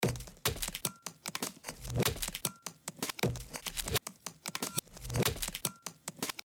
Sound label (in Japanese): グルーヴのみ